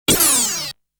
shooting sound

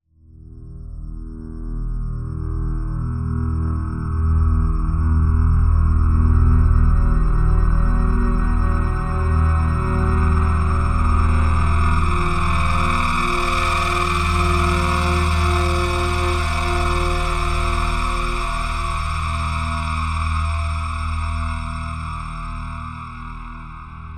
Ambience; Black Mesa Re-Engineered

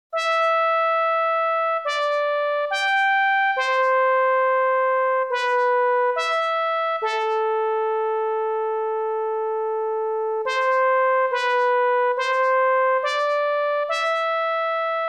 Unification Fanfare; Koei Original BGM Collection; Sangokushi II

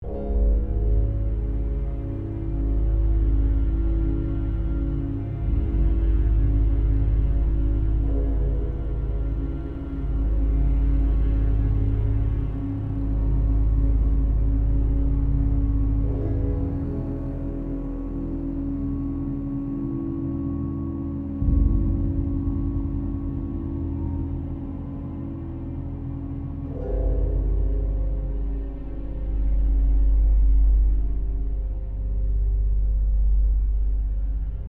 Dialog (Loop)

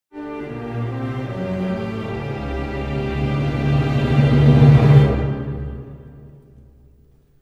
Sting; Off The Jeep; Call of Duty 3; PS2; PS3; Wii; Xbox; Xbox 360

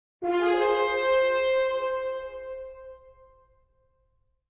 Cutscene Positive Sting; beast quest; ps4

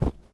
Pillow; doodle jump 2; android

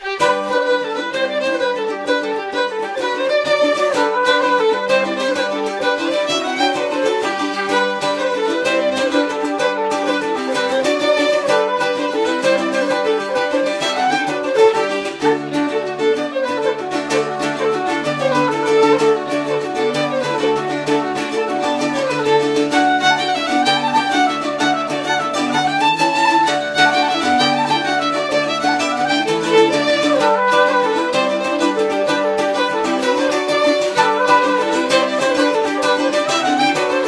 Orchestra Ambience; Assassin's Creed III

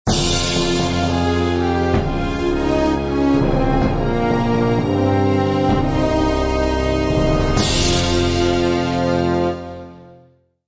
Medieval Agitated; Civilization Revolution; Xbox

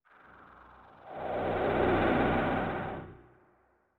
enemy death; SFX enemydeath deathworld2

